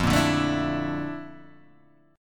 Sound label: Bb Major Sharp 11th F, chord